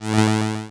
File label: Cry